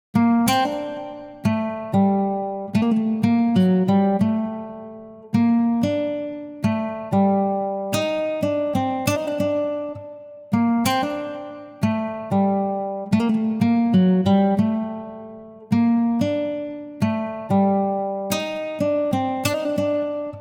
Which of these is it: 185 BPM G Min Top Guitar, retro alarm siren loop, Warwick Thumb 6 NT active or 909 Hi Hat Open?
185 BPM G Min Top Guitar